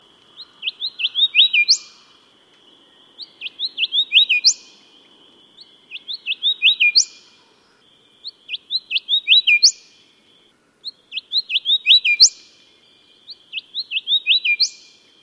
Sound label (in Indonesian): burung kancilan variasi